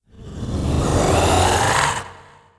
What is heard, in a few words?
Weasel die